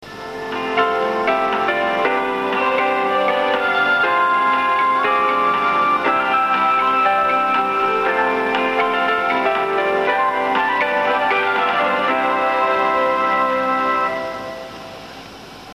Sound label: bus chime; ＪＲバス; 終着（放送後）